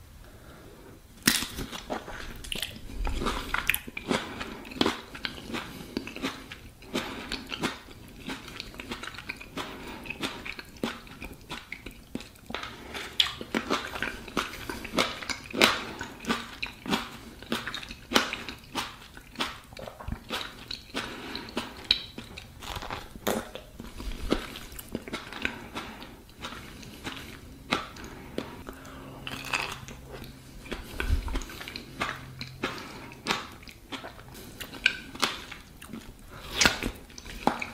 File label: Eating sounds